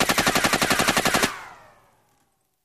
Machine Gun Fire Rapid Burst With Bullet By Whooshes; single burst